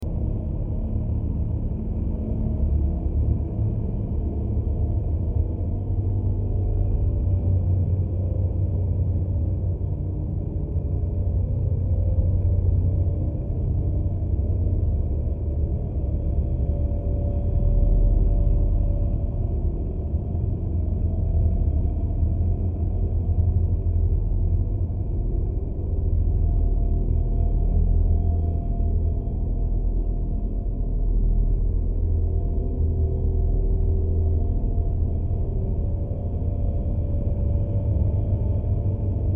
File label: ambient fire synth